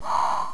mummy; dungeonmaster

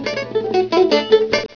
banjo

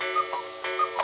player hit; Sea Invaders

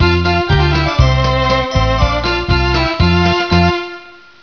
ding dong; Spanish Net

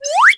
Multiplier Got; Pop the Wheezil™ 1.0.3

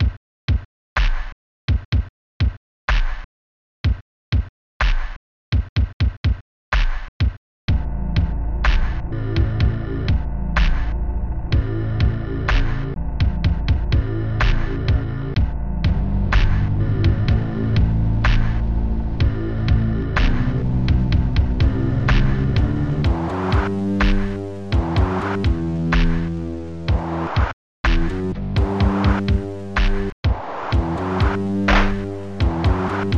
slow beat

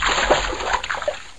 Splashs; Internet 1996 World Exposition; Japan; Fujitsu

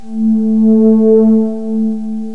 sweep pad, synth pad; gmplay

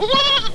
baby lamb, farm animals; Sound Effects for Multimedia - Volume 1

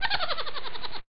giggle; HoppiX

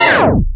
Tape Stop

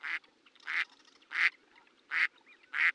DUCK; zones; water

